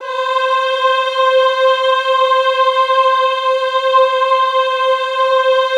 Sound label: EHH C4, Classical Choir; Best Service; Extended Classical Choir; EHH MIX